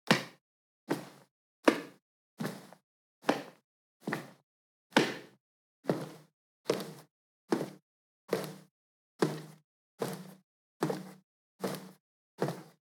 Walking Tennis

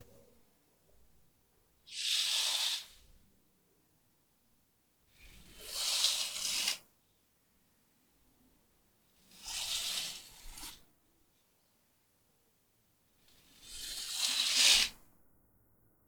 Opening And Closing Curtains Slow

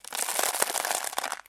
Small Rounded Objects Falling

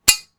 Empty Gun Failure To Fire Trigger Pull

